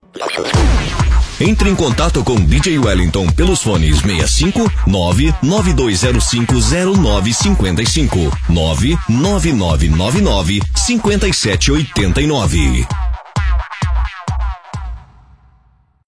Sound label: POP Hits